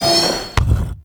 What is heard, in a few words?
glyph puzzle push action start; Warframe; Duviri